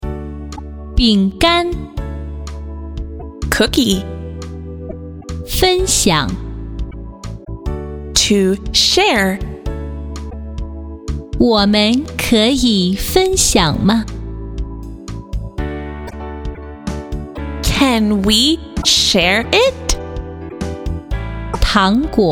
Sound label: voc